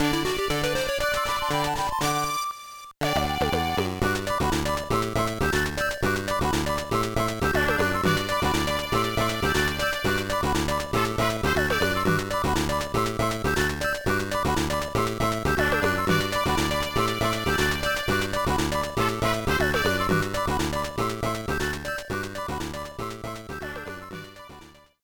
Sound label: BGM; Hamster Paradise Advanchu; gba